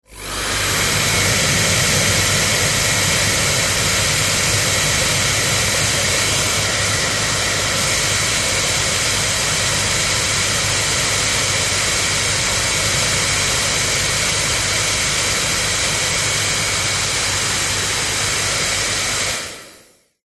household hairdryer on run static off front visage professional